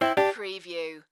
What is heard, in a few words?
Casino Electronic Sound Two Tone